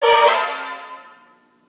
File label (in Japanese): ステータス ダウン